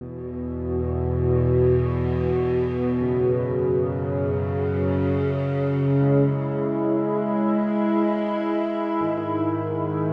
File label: fuzzy